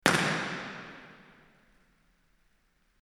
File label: large concert hall